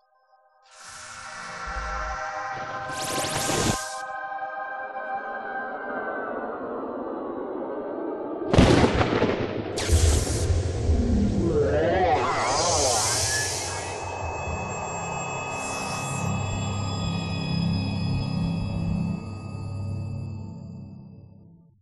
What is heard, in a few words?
World Transition; Spider-Man 2 The Game